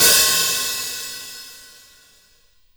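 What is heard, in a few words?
JAZZ KIT hi hat open, Drums Acoustic; AKAI; JAZZKIT 2; 90s sample CD; USB Soundscan vol.10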